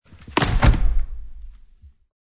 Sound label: bone fall ground; croods; battlespecial